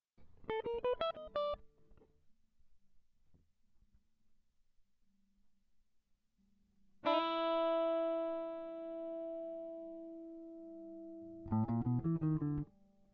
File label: Funky Cravings